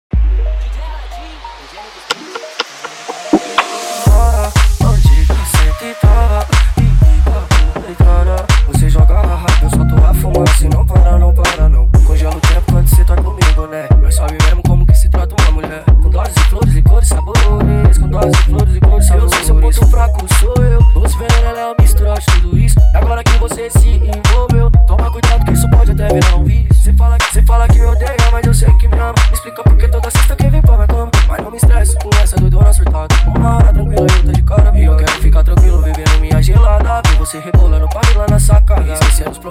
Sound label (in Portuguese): som automotivo